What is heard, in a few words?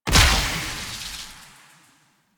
Gas Arrow Explosion, Weapons; Warframe; Infested; Derelict Cernos Prime